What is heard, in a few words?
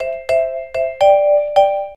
Amenity; Explay Golf; notifications